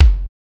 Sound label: old school small reverb kickdrum sample f sharp key